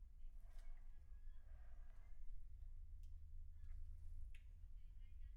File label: Children Choir; Studio Bekol Children Choir